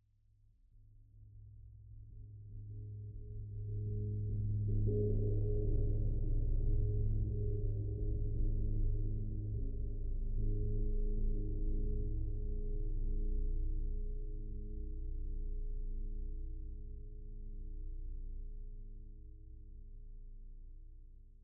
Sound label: Lfe Pulsing Ring Out, Cymbal Flash; Coll Anderson